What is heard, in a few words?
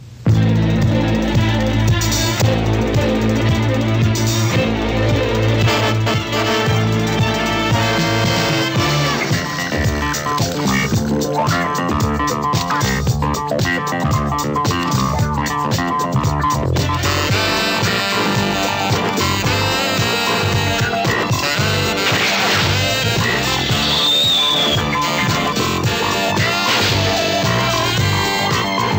Disco